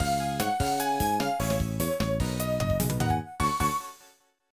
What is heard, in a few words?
CHECKPOINT; Kabushiki Baibai Trainer Kabutore